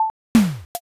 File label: vending